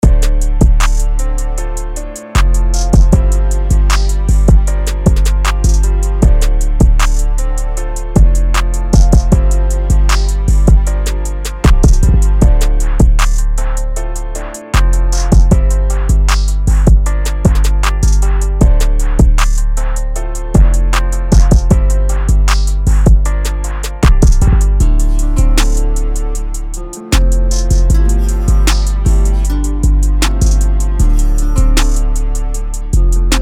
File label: twang